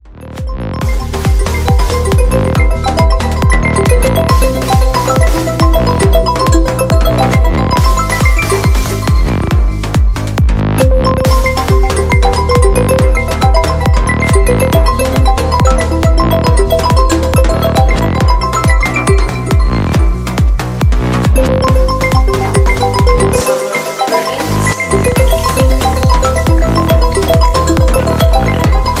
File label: lg stylo 5 ringtone